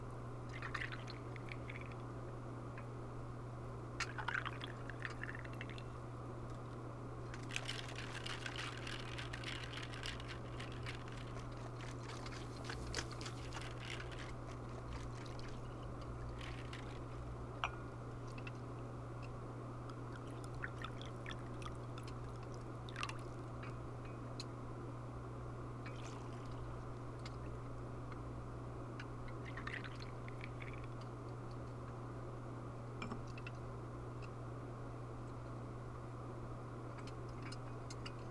evening glass shaker; LOOP; meguriai; anemoi

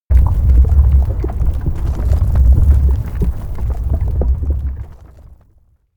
elec ground rumble; anemoi

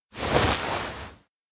hug soft; anemoi

